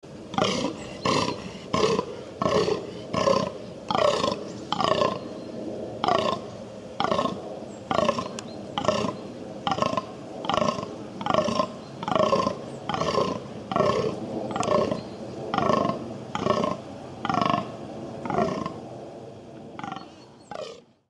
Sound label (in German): rohrender Hirsch